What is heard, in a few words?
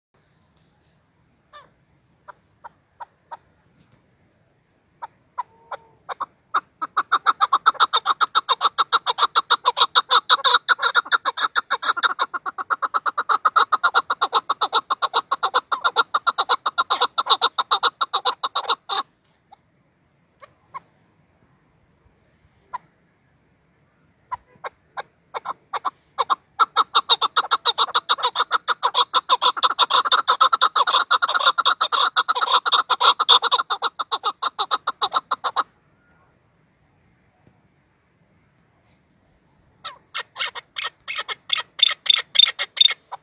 partridge sound; keklik sesi huzurdur; zvuk kuropatki; sawt alhajal